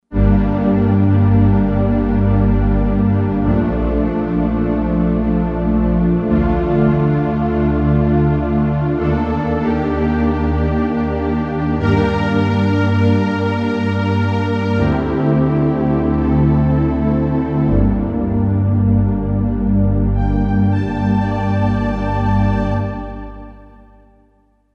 Saw Pad, Korg TR-Rack

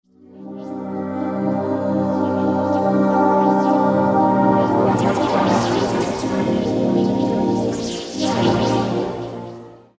Roland V-Synth; Planet OHM; Factory Patches

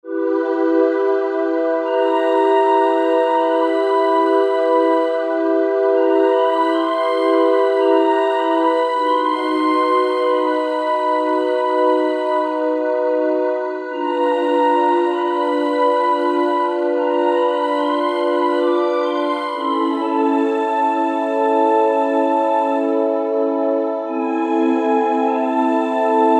Pretty Pad; Generalmusic Equinox

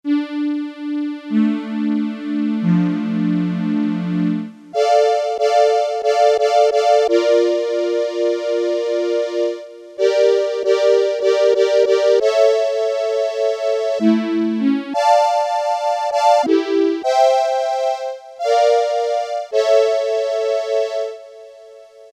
WARM STRINGS, Casio Rapman; Tones

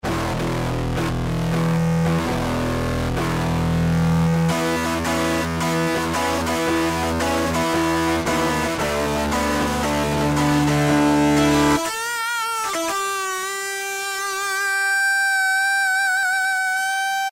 Dist, Yamaha TG33; Factory Presets

